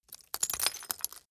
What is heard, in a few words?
glass debris fall single